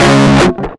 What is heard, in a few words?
guitar stab